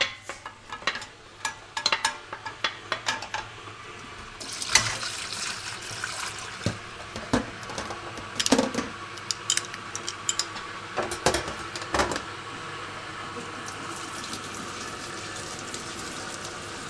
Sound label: summer kitchen sounds; highbury n5